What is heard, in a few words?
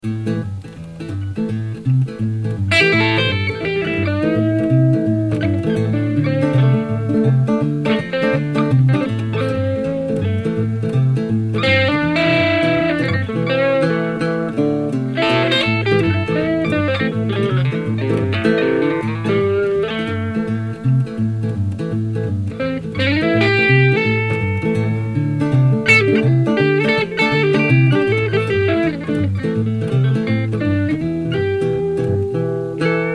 Tele rocking bill solo